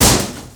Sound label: door kick